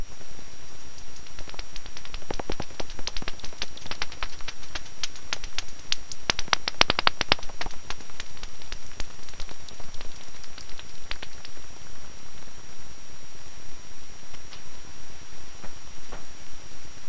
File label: nathusius, bats